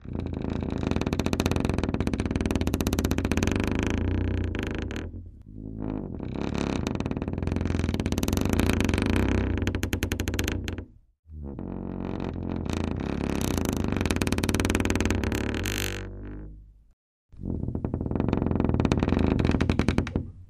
Door Wood Creak Slow Deep; hinges & creaks

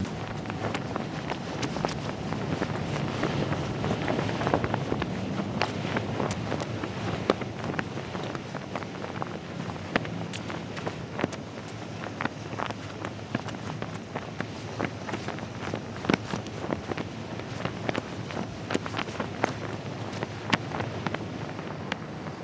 Ship Flag Flaps; flags

